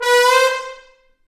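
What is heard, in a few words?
Trombone Section slide, Brass Sections; Roland LCDP06 Brass Sections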